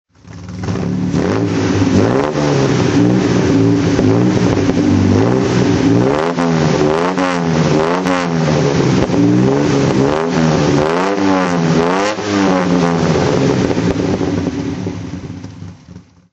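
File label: healey 3000 mk3 throttle, engine sound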